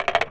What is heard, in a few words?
contact perc